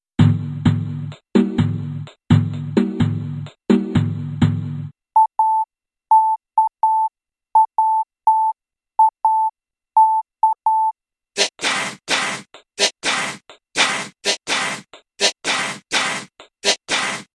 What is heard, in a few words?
morse